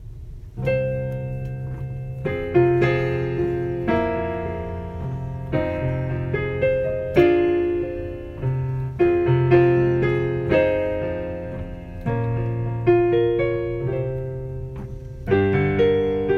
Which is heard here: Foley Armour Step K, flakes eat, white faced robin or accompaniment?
accompaniment